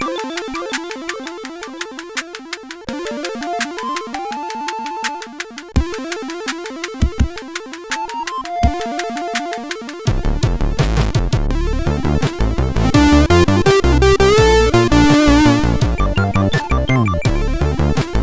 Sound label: Love Funk, CSG8580R5